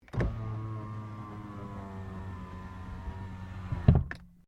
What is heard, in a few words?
CAR SUBARU LIBERTY INTERIOR WINDOW CLOSE; Family members Subaru; Subaru Liberty 2001